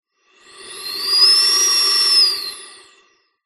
foley air blow inside small tube pipe whistle recorded at opening